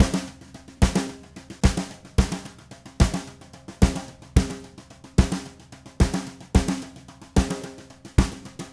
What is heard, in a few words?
Red Maple Groove 110, DW Red Maple Kit